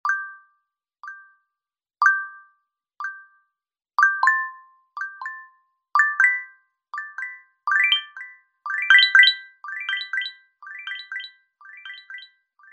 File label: ringtone, cool ringtones